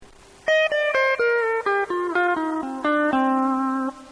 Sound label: Minor Three